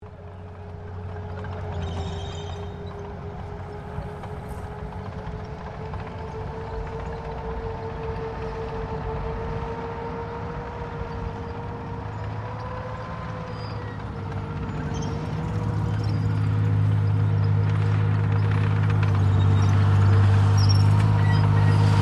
TANK CENTURION MANOUVER DISTANT, British Centurian main battle tank; Truck Sound Effects; Trucks (Sound Effects:Vehicles)